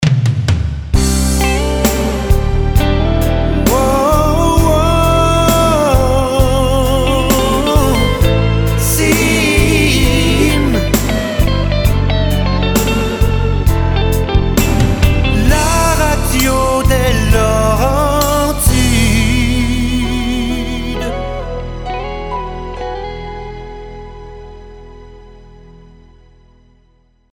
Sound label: BALLAD; CIME FM